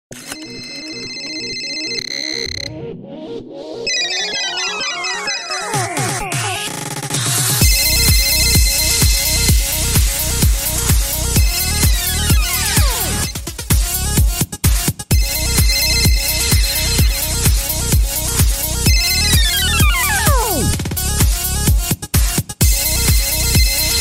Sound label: trance tone ringtone